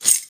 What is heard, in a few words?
keys, wood metal; wood metal SFX